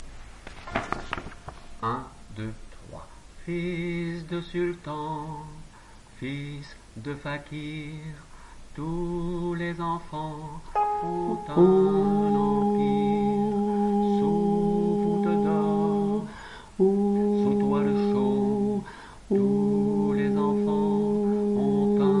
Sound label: Fils Sop; chorale